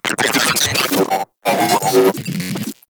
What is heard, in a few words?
Moa Vocal Enemy Spotted; Warframe